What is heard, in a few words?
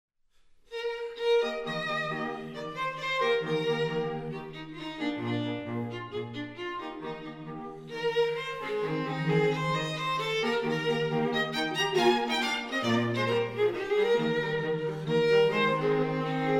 trio